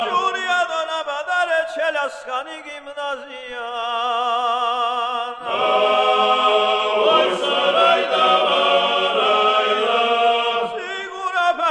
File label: Georgian megrelian; megrelian3